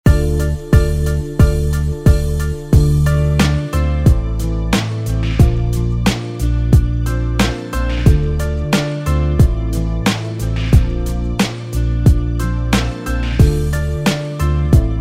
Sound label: Rhodes Ahead